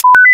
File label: sentry startup; snds jack gmod